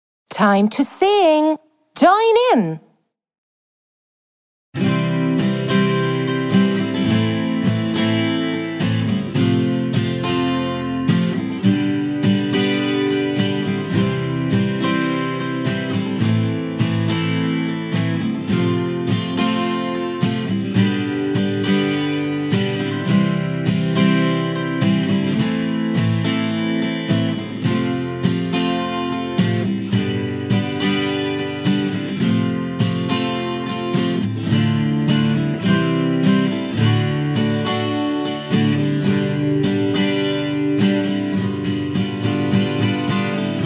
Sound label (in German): englisch lied make a shape karaoke; ich und du